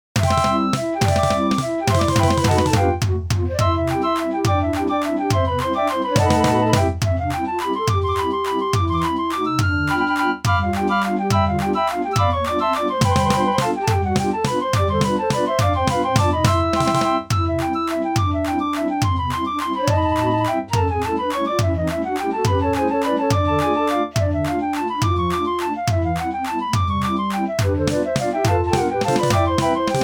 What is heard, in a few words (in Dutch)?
musette, draaiorgels